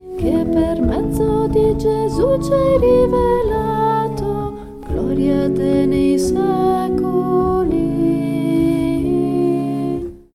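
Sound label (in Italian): Eucarestia Gloria A Te, contralti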